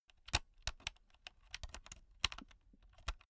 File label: insert usb laptop wrong long; objekte